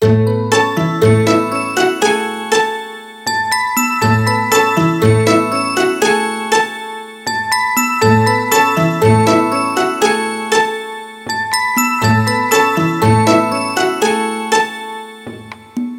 hopping, ringtones; ZTE Maven 2 Z831

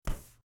moving item release; Nook BNTV250; ui

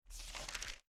Page turn; Nook BNTV250; ui

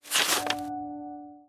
Unlock; Nook BNTV250; ui

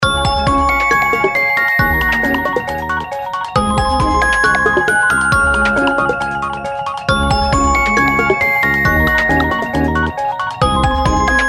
Rolling, ringtones; ZTE Maven 2 Z831

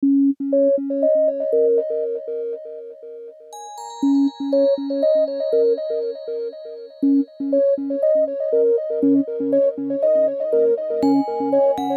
ringtones; ZTE Maven 2 Z831; Android

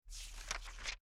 Page turn; Nook BNTV250; ui

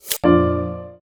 Connect; Nook BNTV250; notifications

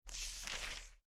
Page turn; Nook BNTV250; ui

